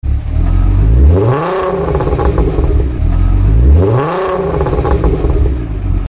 Vw R32 V6

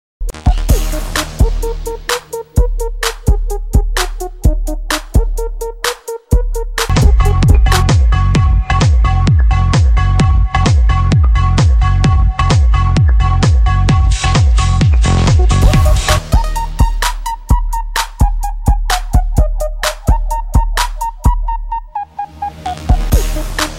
Power Bass

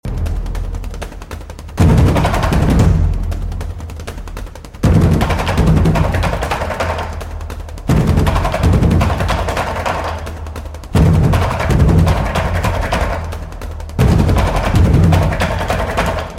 Best Beat

